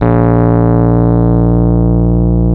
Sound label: A#0, Electric Pianos; Wizoo